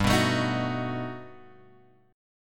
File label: G Augmented Major 9th, Guitar, Open F; G+M9 strummed; 7,6,9,10,9,x